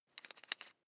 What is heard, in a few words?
electric drill bit unfasten wrench turn